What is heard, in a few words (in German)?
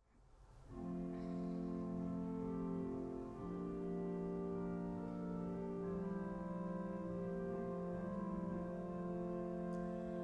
Sanctus aus Missa Cantate Domino